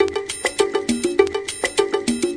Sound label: 101 triangle percusso space; 101 to 110 bpm